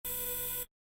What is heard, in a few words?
robotic arm short; soundscrate